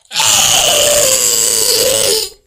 EAHHHOUOUO

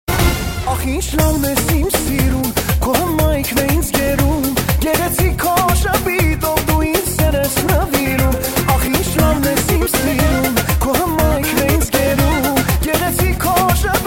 Armenian

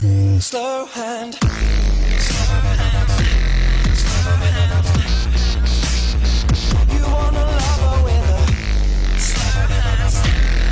Dubstep

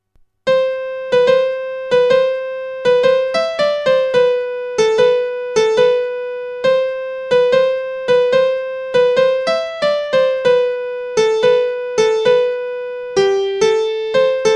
Piano

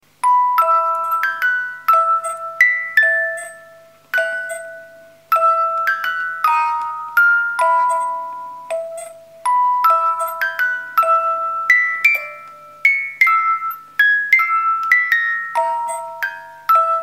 Musicbox; HarryPotter

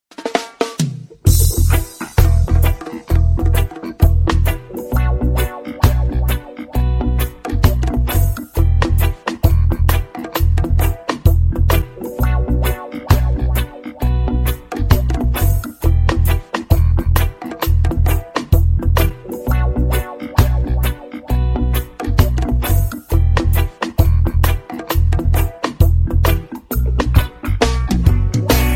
Text Message Reggae

